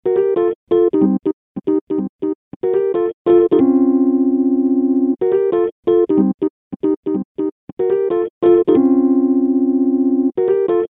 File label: Rhodes; emu mo phatt